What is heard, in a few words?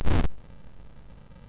sid MOS6581R2, GAMES; Face Off